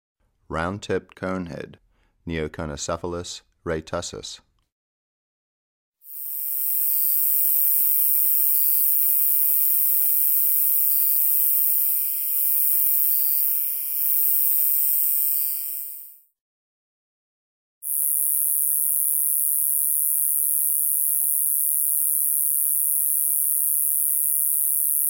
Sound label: Roundtipped Conehead Katydid, Neoconocephalus retusus; Crickets and Katydids